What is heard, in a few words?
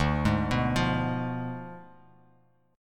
D Diminished 7th D down; arpeggiated